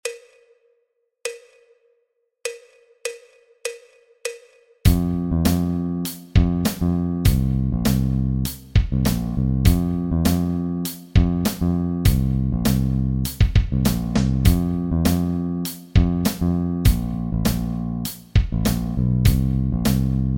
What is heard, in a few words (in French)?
Basse Batterie